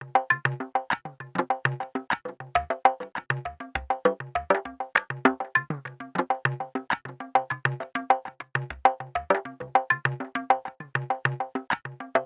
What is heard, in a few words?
Perc Arps